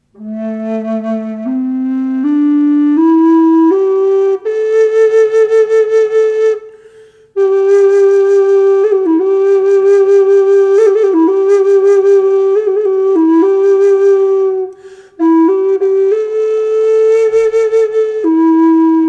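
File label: reverb, flute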